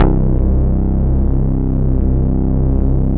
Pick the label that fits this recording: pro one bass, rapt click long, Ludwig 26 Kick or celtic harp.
pro one bass